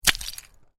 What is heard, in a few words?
Ice smash light, Ice: small piece of ice smashing; Snow; Weather; Stuart Duffield